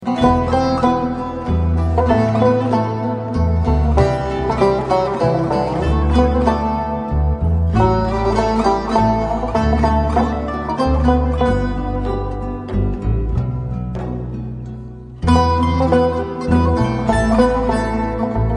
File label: ringtone